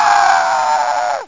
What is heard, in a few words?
SCRECH; ARCADE; Z-FORCE